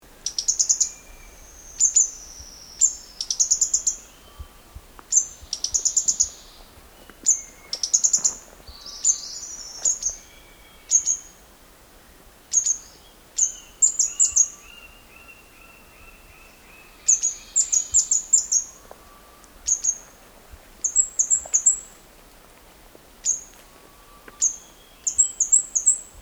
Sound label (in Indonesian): suara cabai perut kuning